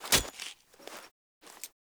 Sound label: Downed Ground Stab, Foley; Enemy; Lady Deora